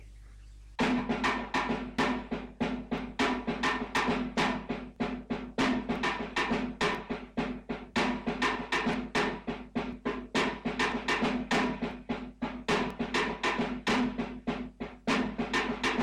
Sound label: Drum